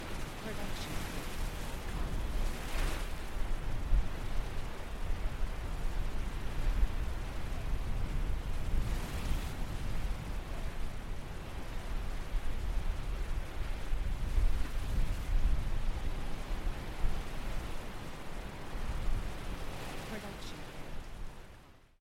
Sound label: loopable waves on beach windy; soundscrate